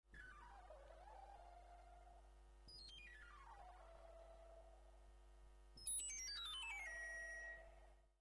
Glissando

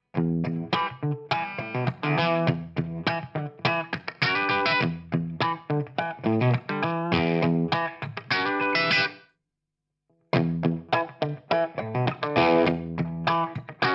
Blackstar Silverline Deluxe Head Clean

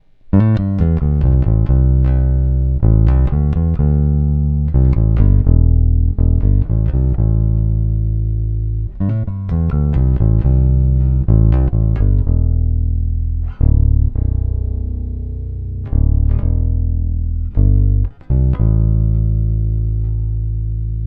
Neck Passive

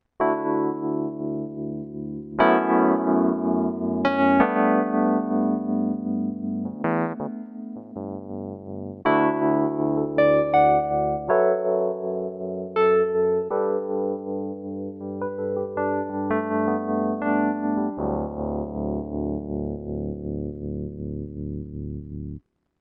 Numa Compact 2x E Piano

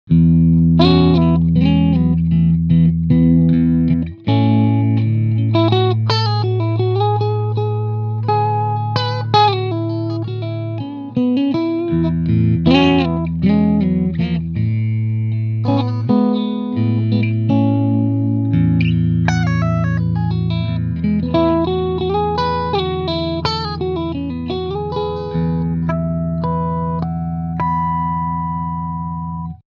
Clean Channel Picking

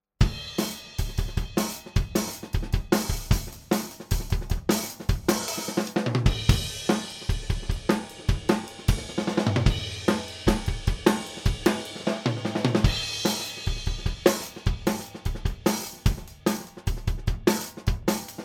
4 Track Drums Pre

